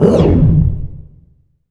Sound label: IMP WHOOSH